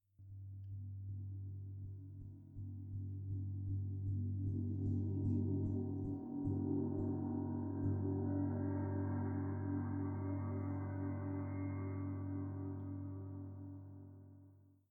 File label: long Gong